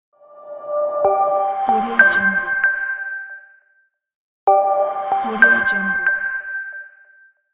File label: simple logo intro